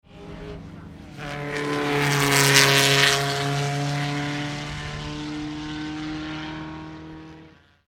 Bugatti T35B track

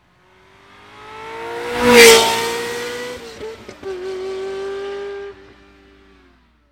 ferrari 355 hot

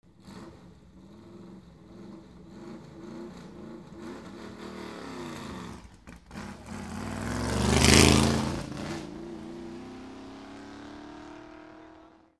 allard

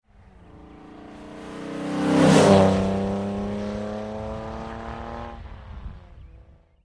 mercedes 300 sl

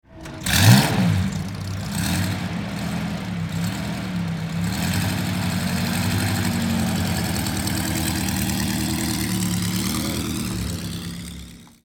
Bugatti T59

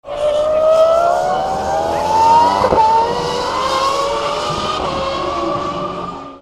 F1 Mercedes

